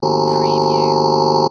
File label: Space Servo Motor